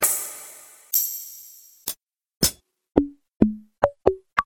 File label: hihats; PvZ